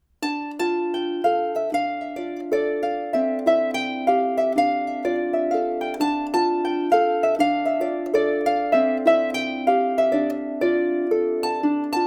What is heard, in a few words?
Svärdsvik Polska